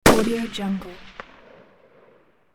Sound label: pistol blast single outdoor long decay